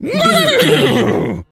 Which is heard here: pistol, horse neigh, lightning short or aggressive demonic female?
horse neigh